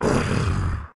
stone monster attack; server-nalc

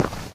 hard footstep; nalc game

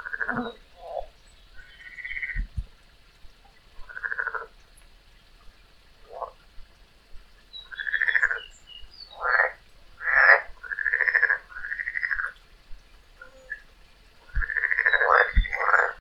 frog; dfcaverns; df ambience

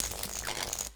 arcing; dfcaverns; underworld items

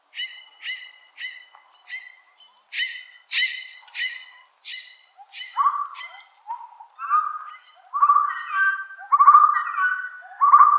jungle bird; ambience; dfcaverns

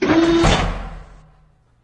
door mechanic, scifi; scifi nodes

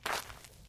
default gravel footstep

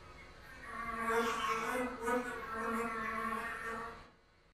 flies; ambience; dfcaverns

